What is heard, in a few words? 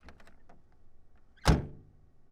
Sedan Door Open Close; Sony Pictures Sound Effects Series; Period Vehicles